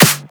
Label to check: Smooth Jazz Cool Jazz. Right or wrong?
Wrong